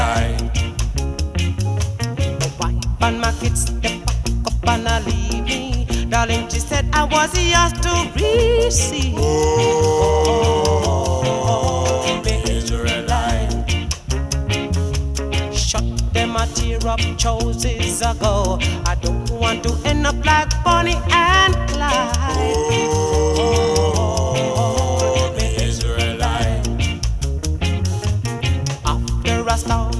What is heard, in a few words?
reggae